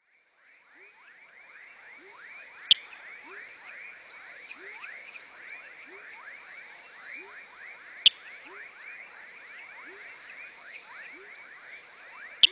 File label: Dendropsophus elianeae